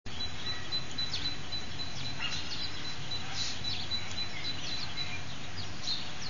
nature vieux amb

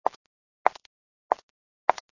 pas talon aiguille

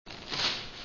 texture journal en l'air

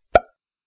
texture plop

